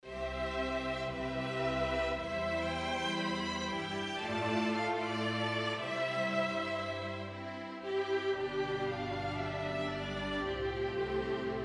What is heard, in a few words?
Overture for String Orchestra